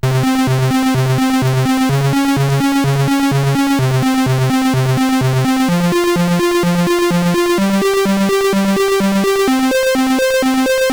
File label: sid CSG8580R5